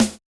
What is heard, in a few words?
Yamaha DJX 2B, Snare H Hard